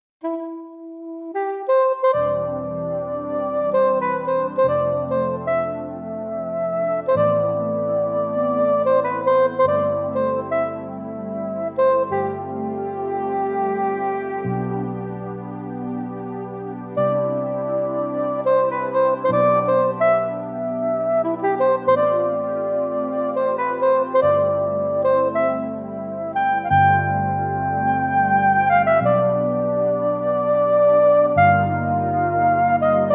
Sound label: Soprano Sax; dexibell vivo s2